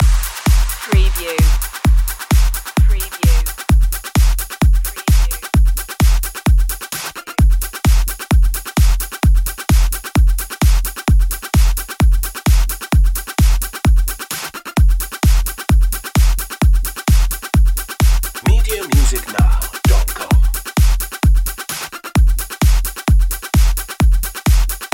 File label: jackhammer loop